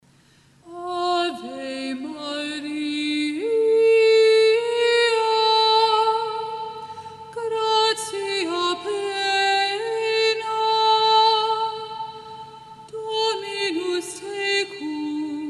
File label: Ave Maria – Gregorian Chant